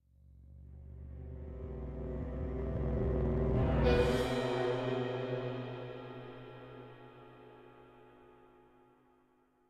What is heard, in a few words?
Crawler Chase Intro 87.5bpm